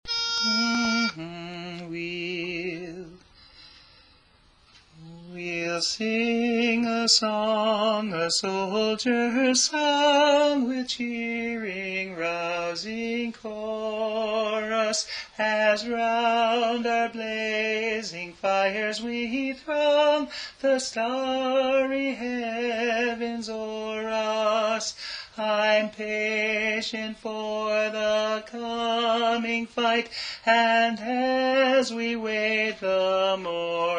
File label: Tenor; The Soldier's Song